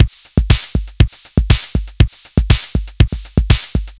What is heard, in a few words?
Electro Funky 120 bpm, Drums; Loops; Ableton Live 12 Lite; Core Library